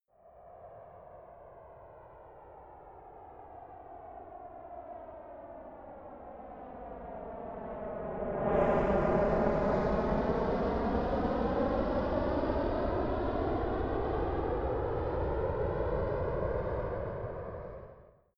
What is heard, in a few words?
military jet f-22 raptor flyby fast distant